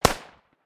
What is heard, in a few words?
AK-47 single shot